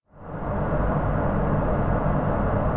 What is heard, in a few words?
vent; Dystopia; silo